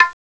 TABLA; CMI

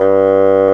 Bassoon; CMI

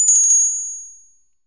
Bell Finger; CMI

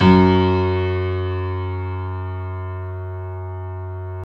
Piano Grand; CMI

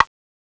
BLOCK; CMI

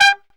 Brass Stab; CMI

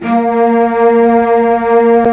Cellos; CMI

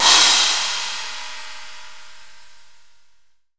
Kit Heavy; CMI

